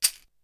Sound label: Shake, Drums; ZERO G - Datafile DISC 3